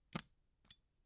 beeper button push click; prosoundfx

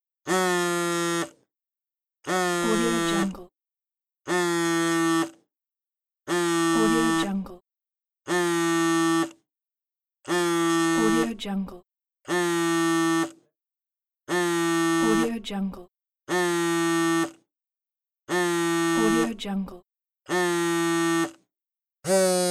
phone sfx pack

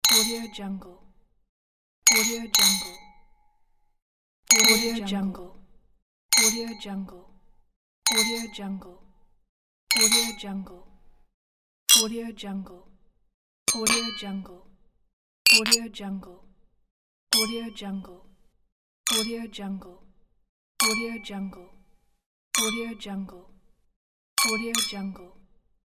clinking of glasses

